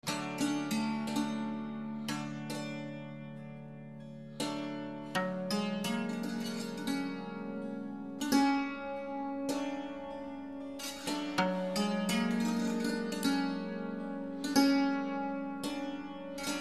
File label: instrument santur